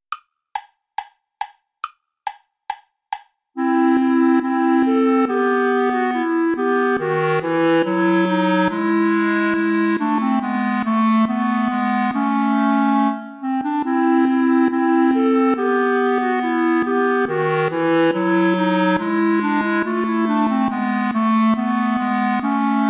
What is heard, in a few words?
allemande play along without bass clarinet